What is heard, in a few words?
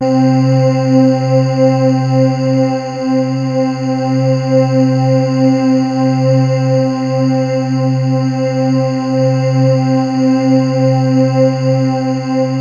VOX PIAN; MORPHEUS